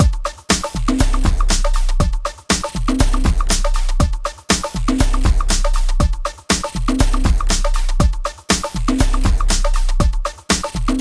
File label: Frog Loopable, music loop; short music loop